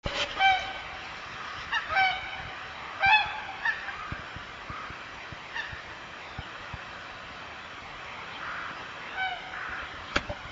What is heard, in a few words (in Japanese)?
hakucho, bird; オオハクチョウ （大白鳥）; 編隊飛行の鳴き声 H.24/3/12 06:50; 朝日を受けて社務所上空を北帰行する編隊; 蘭梅山; 野鳥